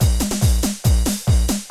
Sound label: techno 140